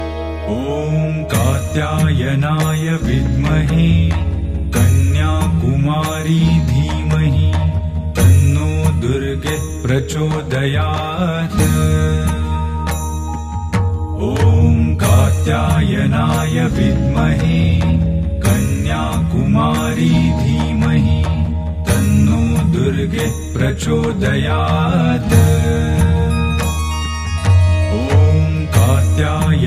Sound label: Durga Gayatri Mantra, Devotional Durga Mantra